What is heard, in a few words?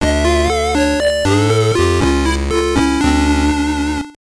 Mission Incomplete; Return of the Ninja; GB